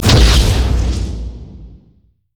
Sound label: summonerspell base teleport; LoL